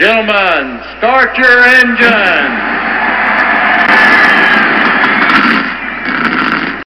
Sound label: gentlemen start your engines car horn